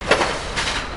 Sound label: pile driver